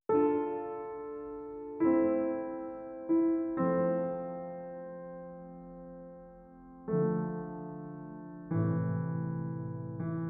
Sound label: Just Piano; Just Piano III